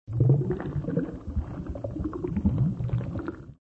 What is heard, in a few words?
Bubble